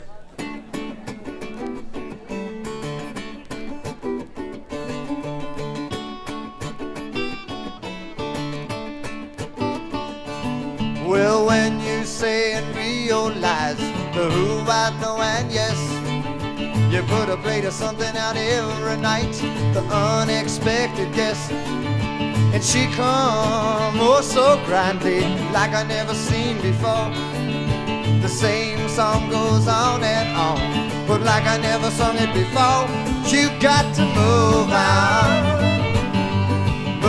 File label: STRING ENSEMBLE